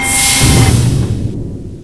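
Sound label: elevator stop; XDM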